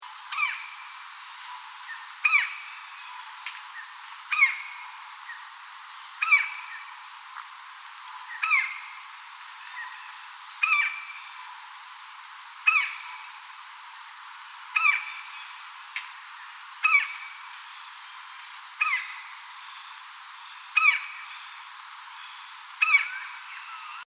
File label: white bellied woodpecker